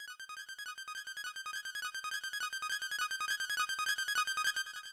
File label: Boot Screen